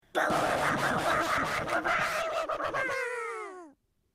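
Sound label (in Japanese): 岩が割れる, 声マネ; 声マネキング; 声マネキング対決; フブみこさん; ホロライブ; さくらみこ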